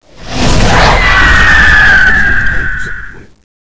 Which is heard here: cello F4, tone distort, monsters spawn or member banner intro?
monsters spawn